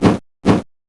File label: Vampire Lord Move Sound; Heroes3